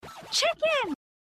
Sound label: Chicken